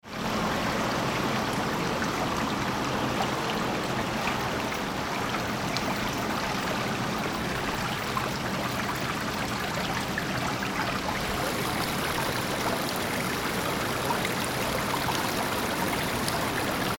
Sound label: river